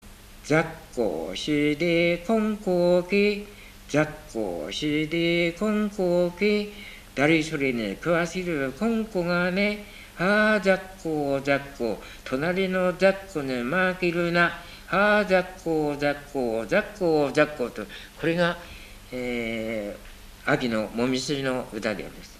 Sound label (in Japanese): warabeuta